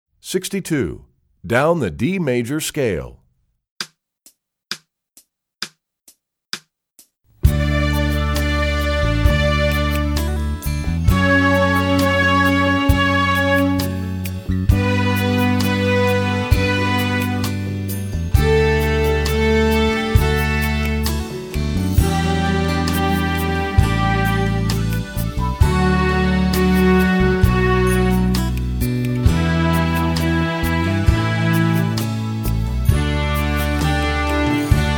Down The D Major Scale, violin

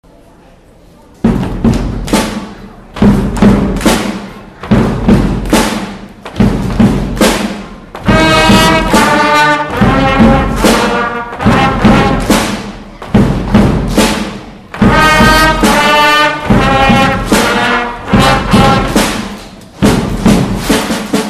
Power Rock, Band, Concert Performances; Spring Concert 2010

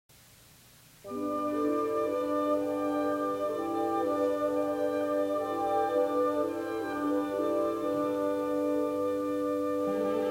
Vocals

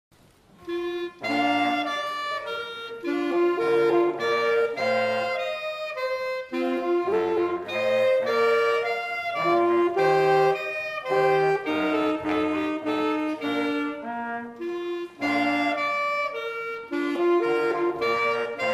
Minuet Quartet, Band, Concert Performances; Spring Concert 2013

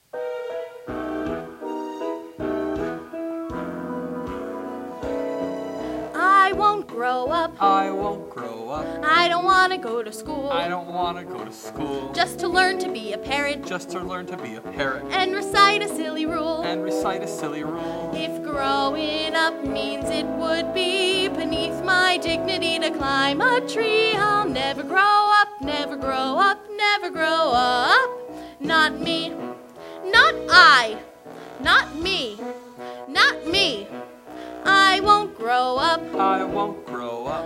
Vocals

